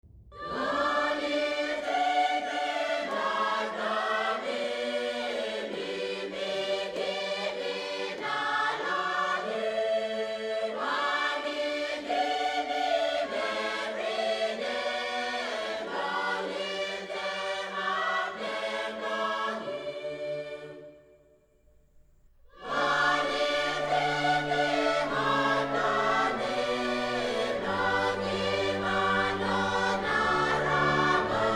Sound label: chorus; Thin Red Line